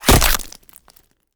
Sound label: gore head hack wet; soundmorph